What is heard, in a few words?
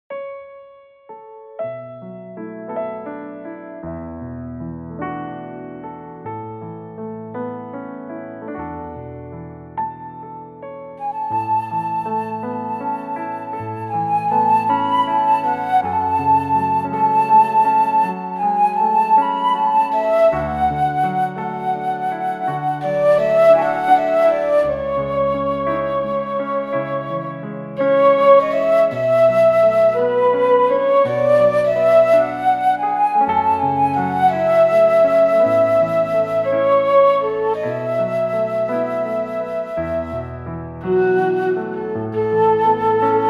A flute